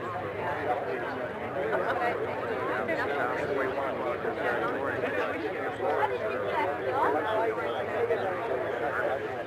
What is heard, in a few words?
Intro Crowd, Restaurant; the princess and the frog; Walla